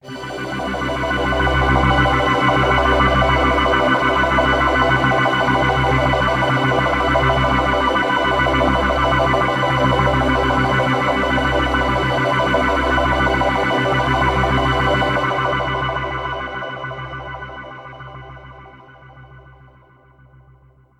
polypad stereo polyphase

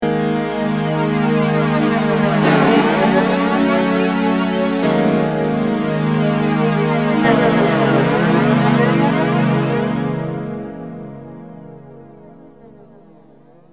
m1000 sbf325

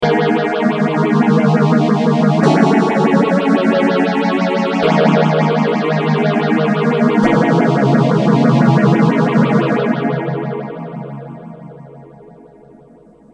m1000 sph323

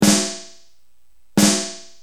DM100

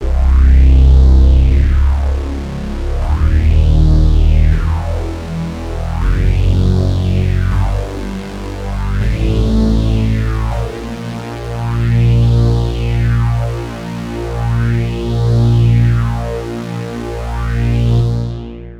octascale stereo polyphase

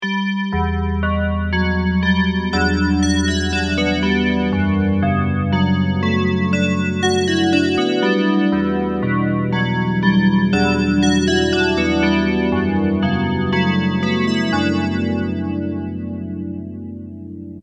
dx7 bells dimension c

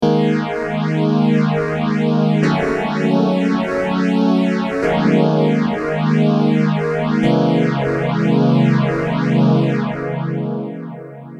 Maestro PS1

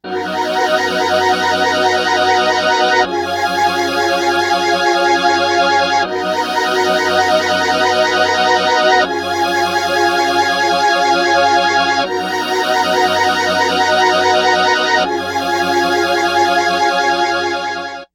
stringtron pt9pro

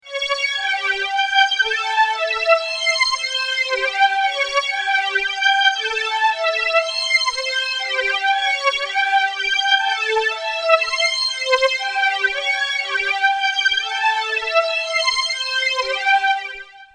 strings, Fender flanger; x5dr

